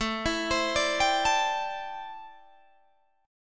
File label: A Minor 11th A Guitar Open G